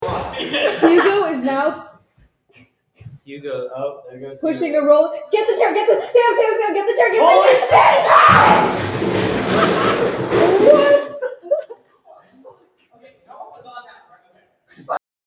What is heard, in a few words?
crash